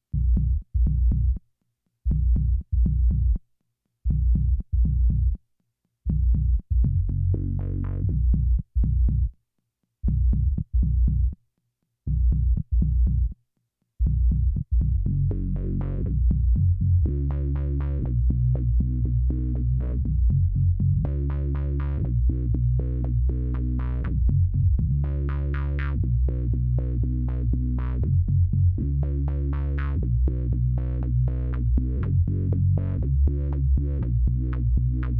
filterfactory lfo, bass; Electrix FilterFactory LFO

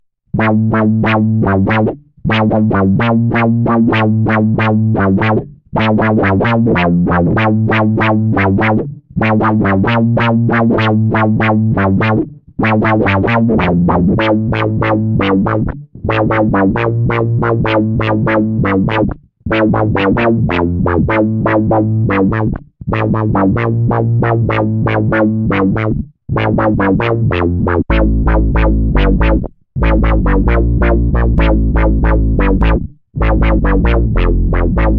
korg g5 with boss oc 2, bass; KORG G5 with a horribly weak sounding down-swept filter for two progressions, add the OC-2 which adds a slight amount of fatness; KORG G5 synth w/ BOSS OC-2

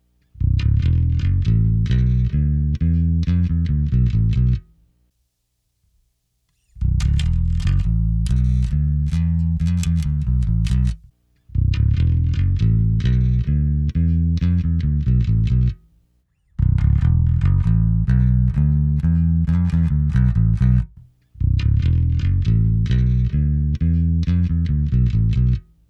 sansamp rbi vs ada mb1, bass; SansAmp RBI vs ASA MB-1; My attempt to create a patch that mimics the SansAmp RBI tone. SansAmp is EQ'ed flat.

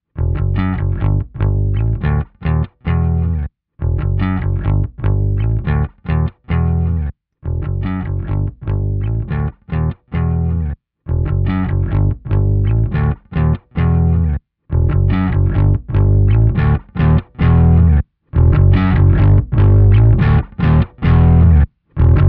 overdrive ehx english muffn tube swap, bass; I swapped the tubes in just the EHX English MUFF'n and run through the gain ranges. First a 12AX7 in the V1 socket and stock 12AY7

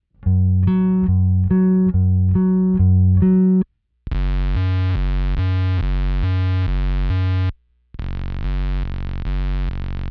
boss oc2 various tones available, bass; Blending the raw OCT1, raw OCT2, and the direct signal in various ways.

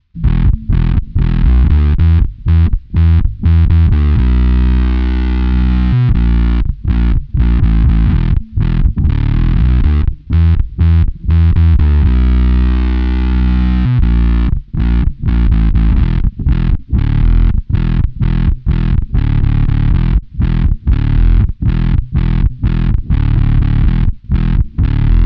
wooly vs browndog, bass; Wooly Mammoth clone VS Brown Dog synthiness comparison; Lakland Duck Dunn into BOSS OC-2 with OCT1 soloed.; Mammoth clone at nearly full gain

